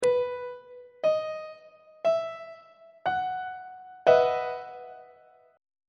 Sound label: f flat major 7th suspended 2nd 2nd inversion on treble clef